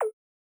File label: Volume control Fun, ui